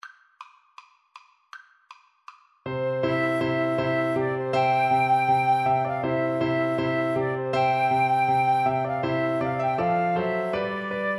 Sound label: Flute Duet